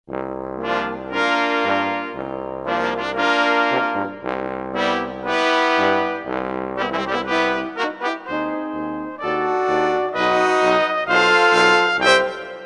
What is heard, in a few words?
Fanfare